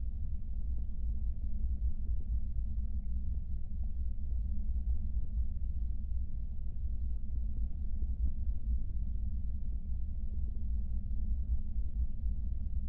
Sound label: Radiostation Dark Rumble Loop; horror and atmosphere fx; Silent Hill Downpour